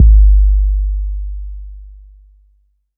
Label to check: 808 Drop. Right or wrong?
Right